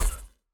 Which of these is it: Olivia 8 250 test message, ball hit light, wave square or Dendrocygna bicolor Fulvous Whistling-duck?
ball hit light